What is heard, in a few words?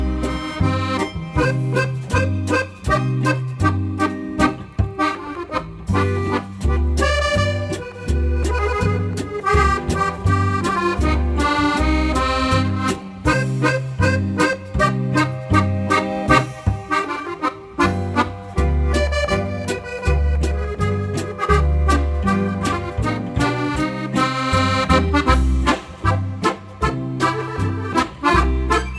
Accordion